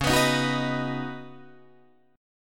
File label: C sharp 7th Suspended 2nd Suspended 4th C sharp down Guitar Drop D